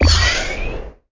powerup speed; Golf Blitz 1.12

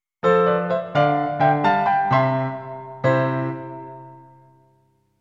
Course Clear Fanfare; Tanoshii Beyer Heiyou Super Mario World complete music collection 1993

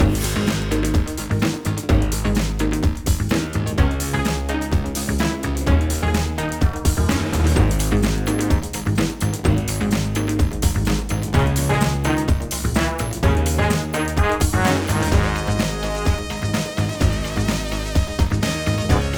Music Boss Fight; Timberman The Big Adventure; ps4; xbox series xs

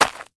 foley footstep single dirt

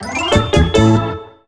Fish Get

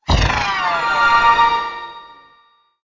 Golfer Upgrade XP Drain; Golf Blitz 1.12

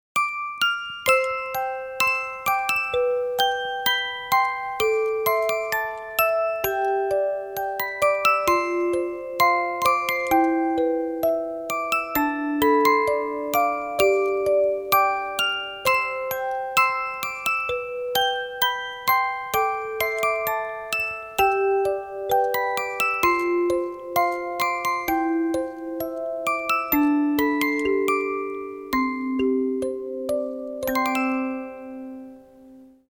Music Box; My Time At Portia

